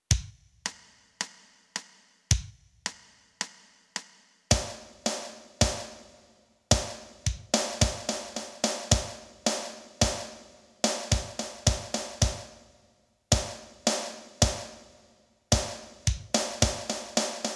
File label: perc; backing tracks